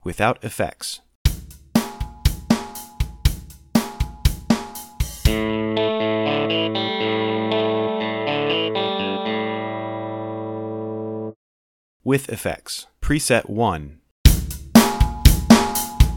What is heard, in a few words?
distortion; Mixcraft; acoustica